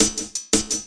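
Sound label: LOOP snare drum, Drum & Bass, 170 BPM; Ueberschall; Drum & Bass Resonance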